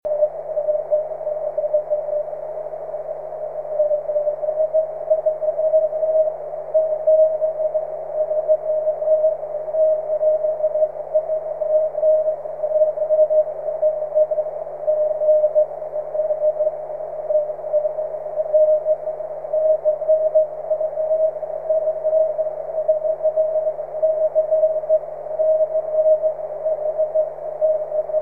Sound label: 13cm cw